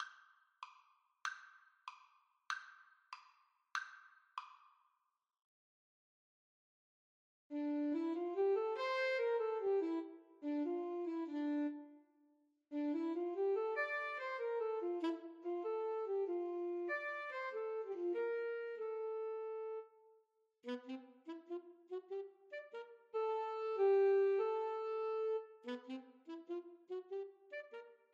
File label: Alto Saxophone Duet